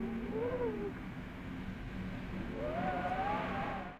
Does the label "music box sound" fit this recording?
No